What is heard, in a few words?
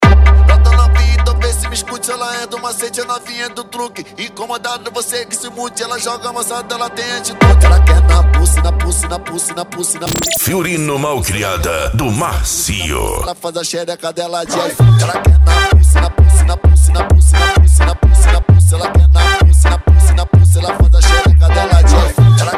mega funk bass